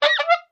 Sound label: Horns 1 Various Party